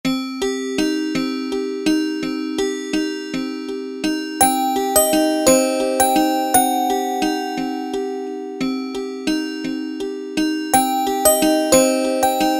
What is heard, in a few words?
Music Box, Kawai K1m; Factory patches - I banks